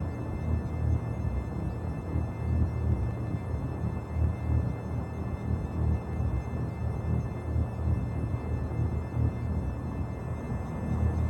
Sci Fi Synth Ambience Horror

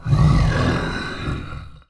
Monster crawl

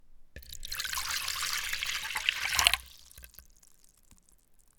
Water Pouring Wood bowl

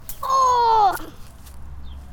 Aaaaugh

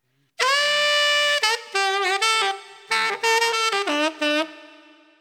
Calling Out 8 Beat Dm 90

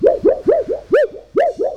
Sheet Waving

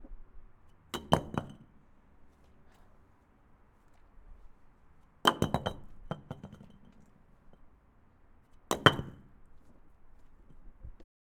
Brick Hitting Wall

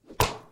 Whack

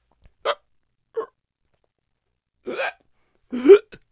Male Hiccups And Choke

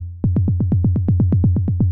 Rhythm maker loop 125 Bpm

